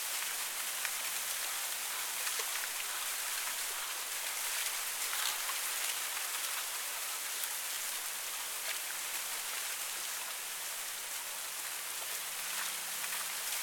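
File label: Environment Palmtree-Leaves Wind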